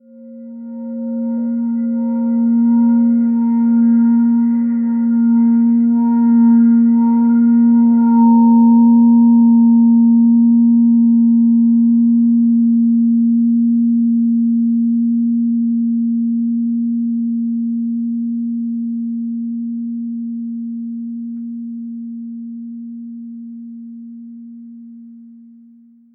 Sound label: a note 440 hz perfect pitch third eye chakra; intuition